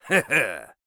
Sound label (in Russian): Смех; Маккри